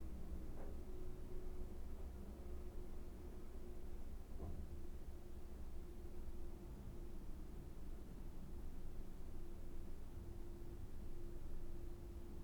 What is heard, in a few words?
Room Tone Calm 9pm